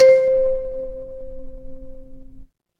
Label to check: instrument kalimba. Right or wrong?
Right